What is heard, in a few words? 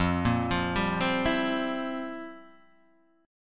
F Major 7th Suspended 2nd Suspended 4th; FM7sus2sus4 Chord {1 1 3 0 1 0} arpeggiated